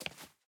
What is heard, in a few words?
Step Heel Marble; Game rip; MiSide